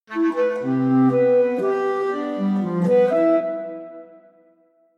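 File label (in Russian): кларнет малого размера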